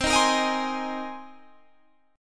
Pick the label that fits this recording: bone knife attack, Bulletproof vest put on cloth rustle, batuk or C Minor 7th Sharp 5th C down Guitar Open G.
C Minor 7th Sharp 5th C down Guitar Open G